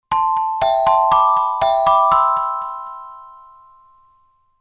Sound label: Jingle